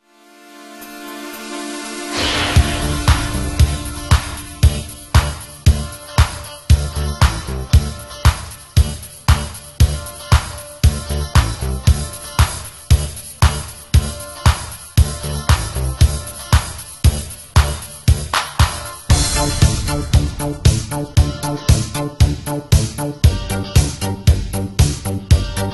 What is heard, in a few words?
instrumental